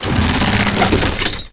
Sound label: reload crossbow Chamber